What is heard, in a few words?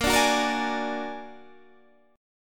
Bb Augmented 7th Bb down Guitar Standard; Bb+7; strummed; JGuitar